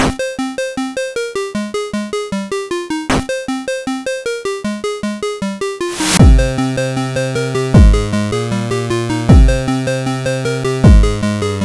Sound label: song